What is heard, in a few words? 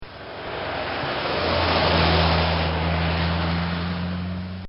Car Cornering Quickly